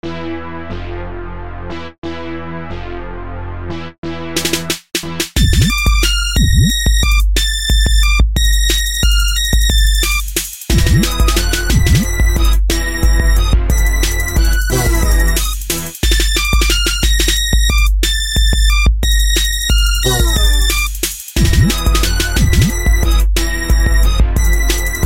instrumental gangst

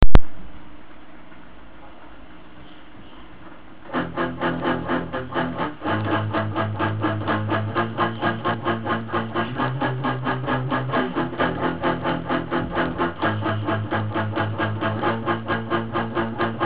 rocknroll

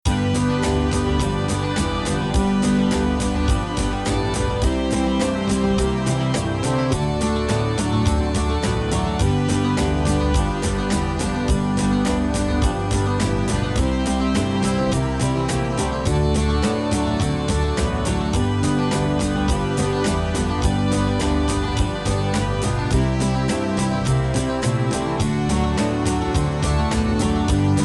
instrumental

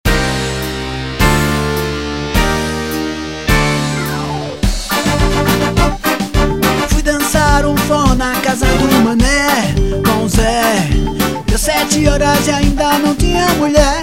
forro

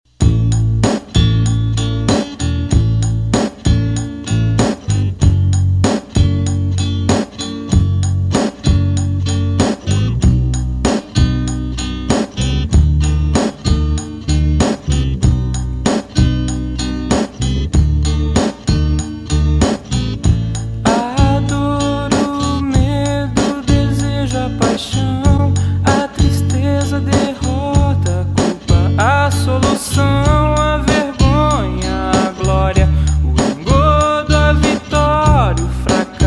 cellos

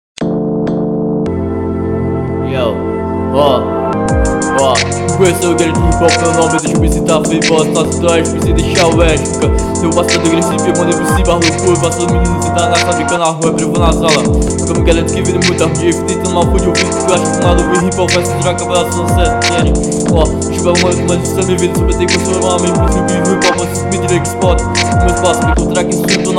hip hop

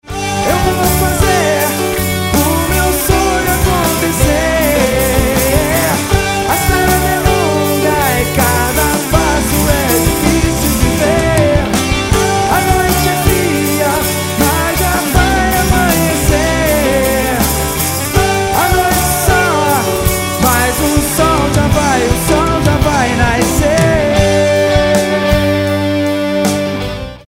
ringtone

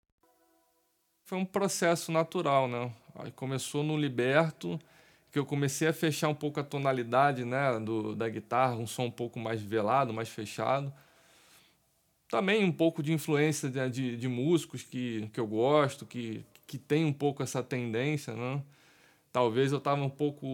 Blues

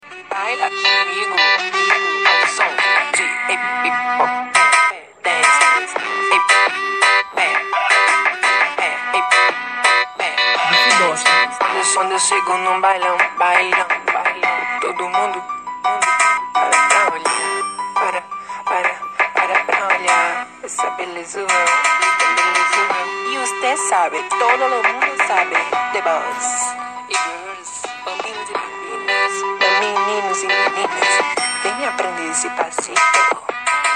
dance remix

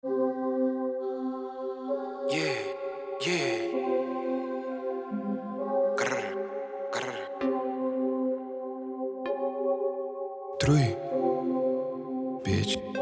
rap trap

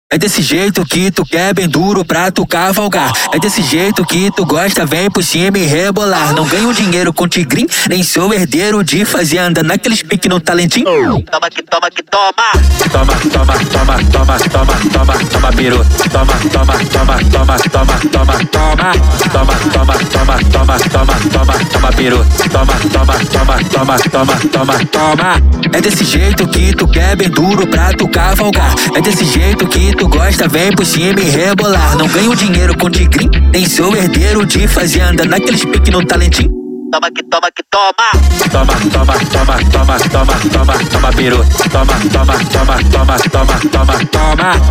synth

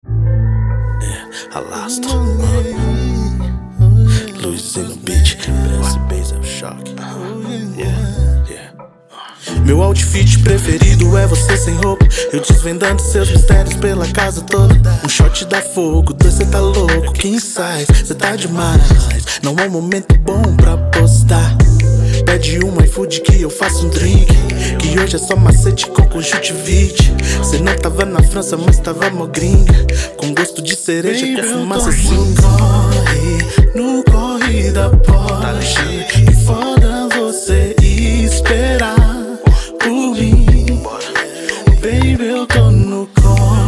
rap trap rnb

